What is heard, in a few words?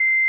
final beep; OpenBoard; Stopwatch